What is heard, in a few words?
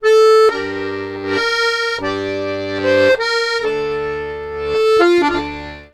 Accordions; USB Soundscan vol.40; AKAI